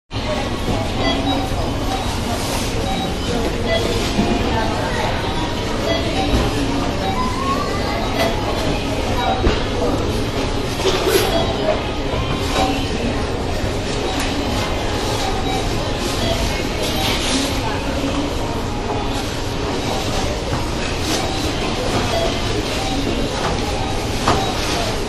supermarket beep

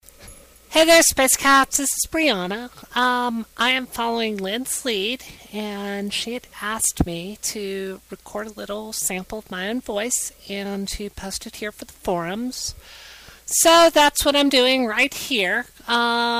female voice

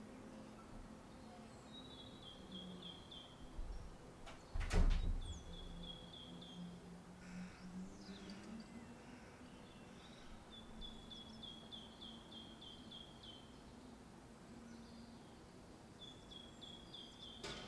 stereo spring soundscape on a sunday afternoon

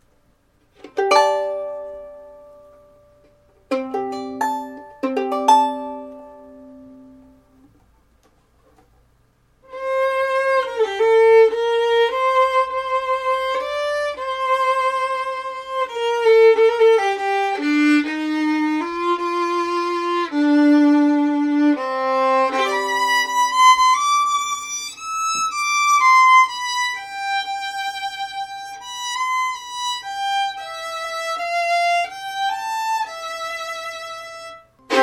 soprano violin

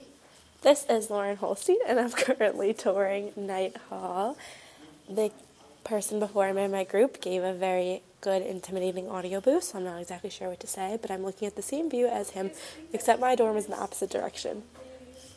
running around knight hall

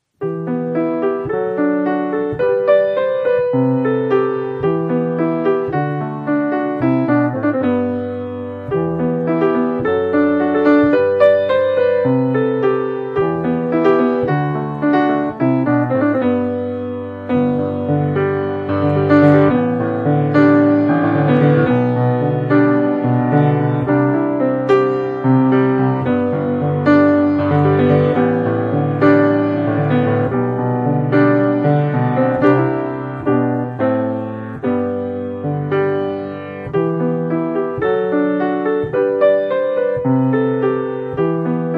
test recording of yamaha c3 grand piano; Olympus DM670

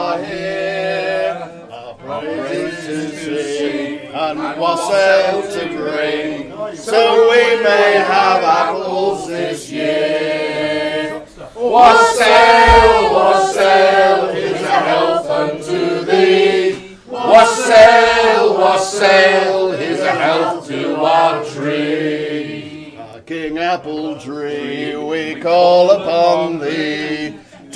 Singing the Chorus

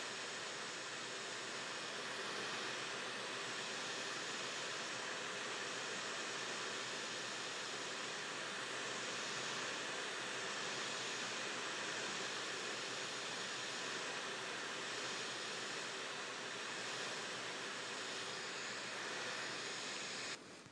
my xbox on noise

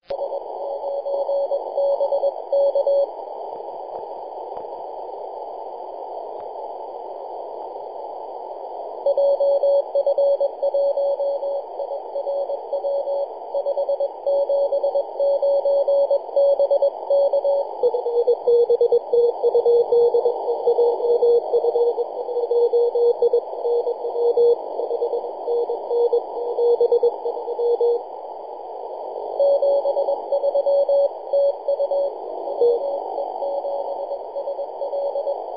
cw; ve7sl; 50.087mhz

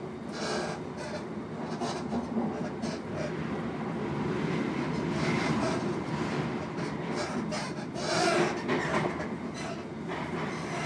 creaky train

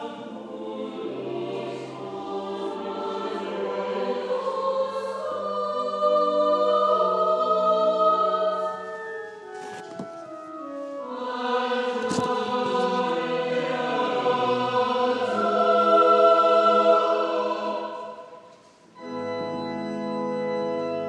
choir rehearsing in St Pauls

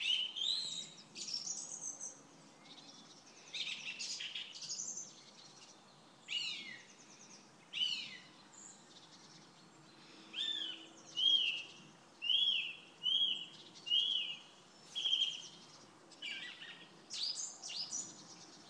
dawn chorus

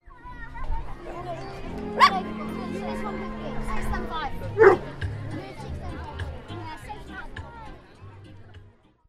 a disagreement in the village dog competition

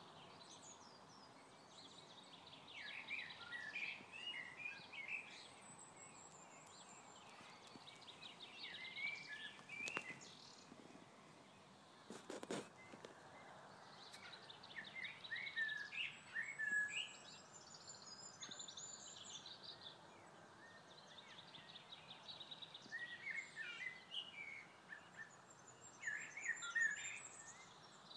spring birdsong Harley village near Shrewsbury

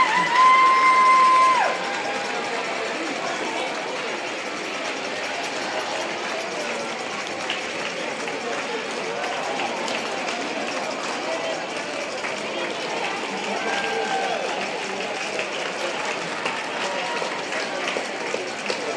returning us soldiers arriving at bwi airport

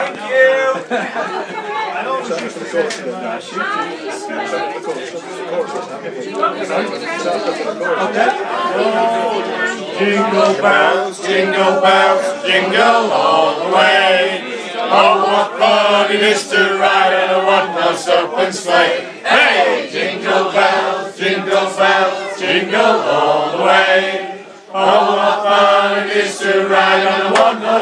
jingle bells sung in the white hart bradwell

